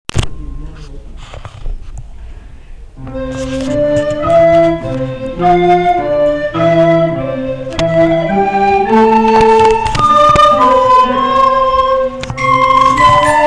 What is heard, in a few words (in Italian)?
solo musica; esprimersi in poesia 2010 11